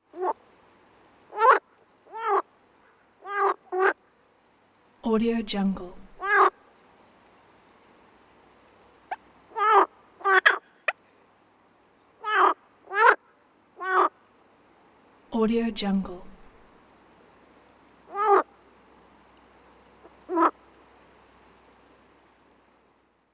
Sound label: talking frogs on the lakeshore; jablonczay